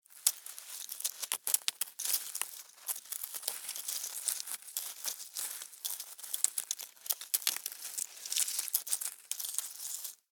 Insect Legs Walk, Foley, Dirt; Animal; Insect Movement; BlastWave

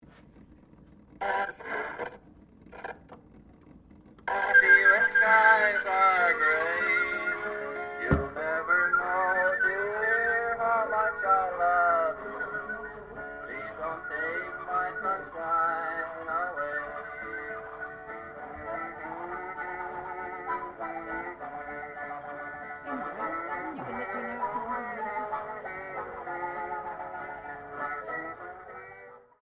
GRAMOPHONE 1800S OPERATING, Antique gramophone; Miscellaneous Musical (Sound Effects:Musical)

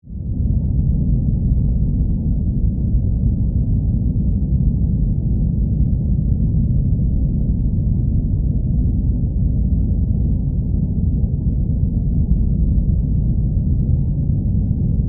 Spaceship Exterior, Mother, Ship; Science, Fiction; Spacecraft

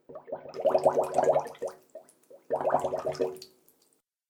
Water Bubble Sounds; Water Sound Effects; Agcnf Media

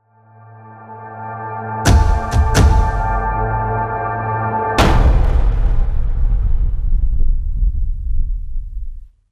Drum Impact Movie Trailer; Sonic Logo Sound Effects

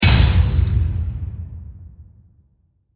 Hit Metal Hard; Production Element

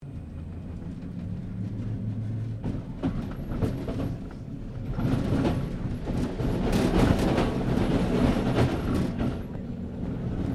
Tram Melbourne W Class Crossing Points; Melbourne Trams; Streetcars; Vehicles

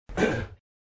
Mle Clr Thrt, Man quickly clears his throat.; Clearing Throat; Human

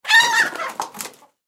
Hen Startled Wings, Hen Startled Flying Chicken; Animal; Chicken Sound Effects

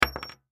Bullet Shell; 44 Drop Wood; Warfare; Drops (Sound Effects:Objects); Blastwave

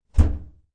Washer Shut, Washer door dropped shut.; Laundry; Sound Effects:Household

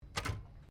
Typewriter 1950s Space Bar; Old typewriters; Typing; Offices

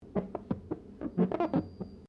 GLASS SQUEAK FINGER SEQUENCE

